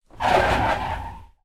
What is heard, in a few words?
household shower cubical door slide